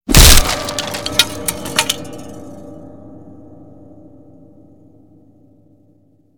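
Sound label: guitar smashed, broken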